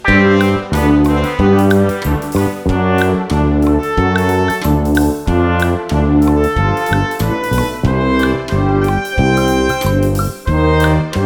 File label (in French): trompette et trombone; Bienvenue en Animozar